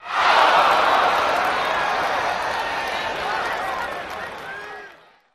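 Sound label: Crowd Cheer Ooohh Outdoor, baseball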